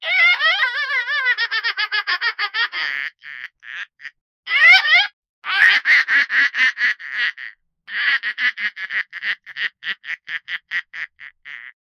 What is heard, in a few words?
laughing duck sounds